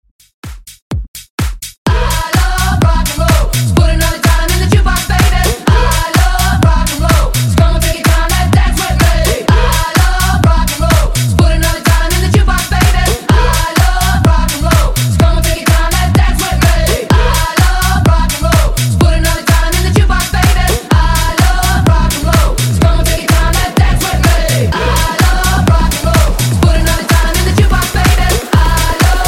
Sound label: EDM Redrum